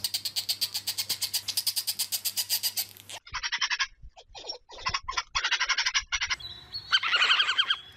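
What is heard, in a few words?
badger; barsuk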